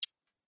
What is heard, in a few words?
Sharp Single Click, click sound effects